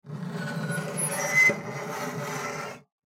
Scrape Metal Grind, Foley Scrape Metal Grind Squeak; Materials; Blastwave